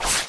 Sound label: knife; cstrike; codlife